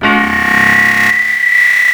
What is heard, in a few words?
PIANO FX; Big Beat Samples & More; AKAI; KEYBOARD KIT